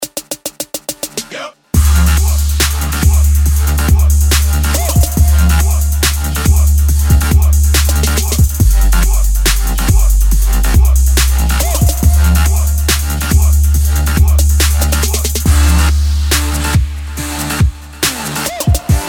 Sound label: trap